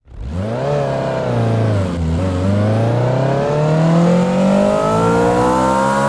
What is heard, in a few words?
first, vehicles; gmod; tdmcars; hon crx sir